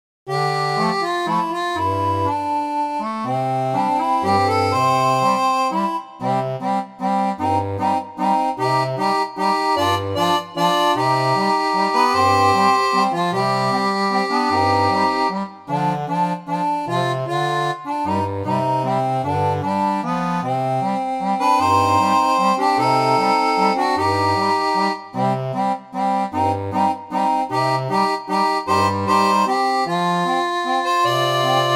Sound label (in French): orgue barbarie